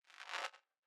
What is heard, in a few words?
zap